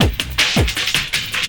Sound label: LOOP, 160 BPM, Drum & Bass; Ueberschall; Drum & Bass Flash Trackz